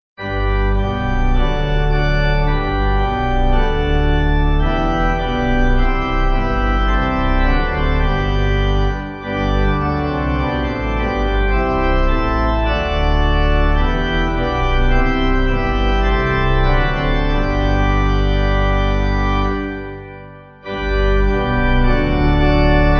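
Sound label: Pipe Organ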